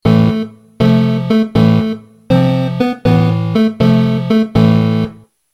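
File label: funeral march 8 bit; Pohoronnyi marsh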